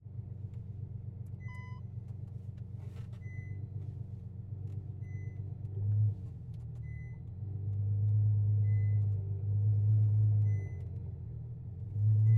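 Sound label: Ferrari F12 On board Slow Drive Various Interior; AMBEO4; 2016; Pole Position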